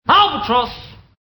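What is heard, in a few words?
albatross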